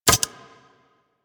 Animal Lure Disable Mech; Venus

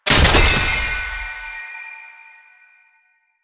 Gunbrella Last Shot, Weapons; Warframe; Tenno; Koumei

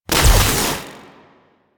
Gun Shot; Warframe; Thano Tech Arch Gun

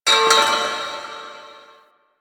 Metal Debris; Ambience; Warframe; The New War; Quests; Zariman

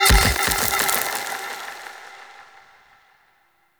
Blind Sand Target Activate; Powers; Fey; Saboteur

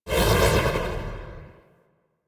Universe Ring Teleport; Warframe; Warframes; Nezha

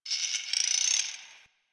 Landscape Plant Critter, Ambience; One Offs; Warframe; Venus Open World

